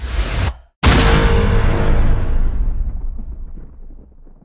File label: seismic charge